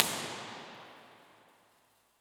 Littlefield Lobby, Impulse Response; EchoThief Impulse Response Library

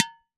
Flower pot, IR mono; Claustrofobia v1.1; Flower pot 2

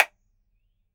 Small speaker, Small intercom speaker; Speakers and telephones

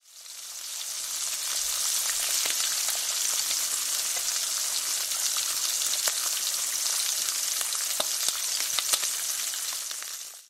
Bacon, sound design

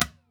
Large Plastic Bin

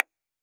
Small speaker mono, Small intercom speaker; Speakers and telephones

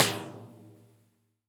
Drainage Pipe, Impulse Response; EchoThief Impulse Response Library; Underground; Salton Sea

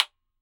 Very small speaker; Speakers and telephones

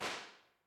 Smooth, impulse responses; Henry Olonga; The Beautiful Room

